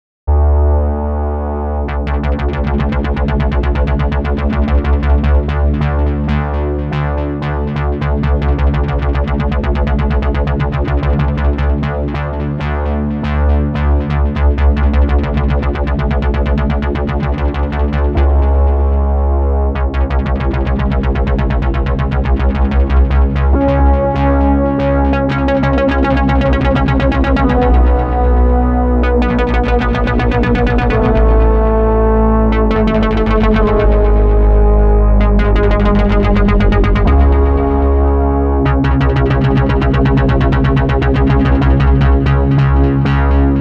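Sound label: rhythmic kochab hold key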